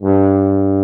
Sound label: Orchestral Brass